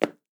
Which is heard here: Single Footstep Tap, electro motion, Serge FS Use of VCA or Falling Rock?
Single Footstep Tap